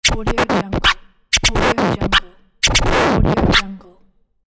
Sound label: hip hop rhythmic scratch